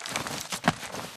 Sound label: crawl grunt; Titanfall 2